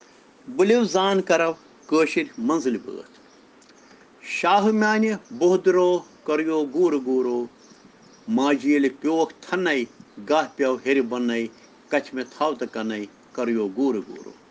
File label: Children Rhyms in Kashmiri